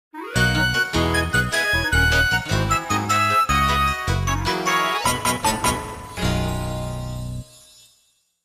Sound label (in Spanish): Tema de victoria; Yoshi; SSB